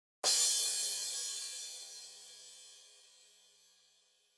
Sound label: Crash